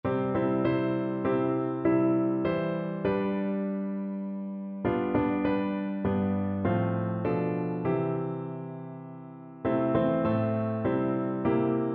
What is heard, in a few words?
piano